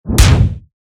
ハンマー 通常攻撃 モノラル; 武器効果音

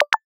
メッセージ

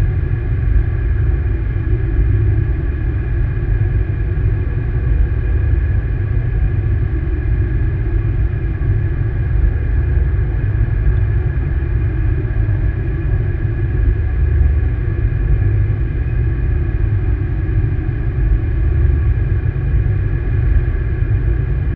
ダンジョン・地下・フィールド

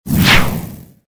火矢の飛ぶ音; モノラル

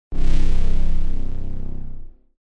ブォーン

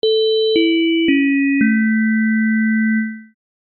お知らせ 終わり 遅め; 戏剧音效

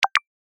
メッセージ

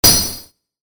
ランク レア度1 モノラル

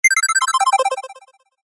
キャンセル 高音 レトロ

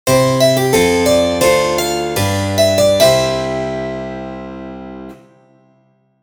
教会 祈り チェンバロ モノラル

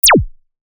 プチュン トランジション

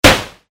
アサルトライフル 1発; モノラル; 武器効果音

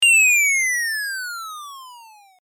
ピューン 落ちる音

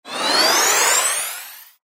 タメ

